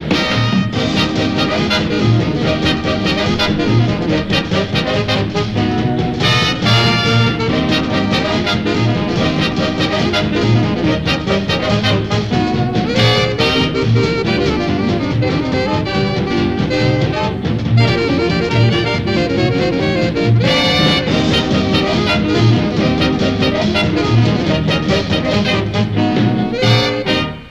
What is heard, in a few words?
Harlem Congo Rhythm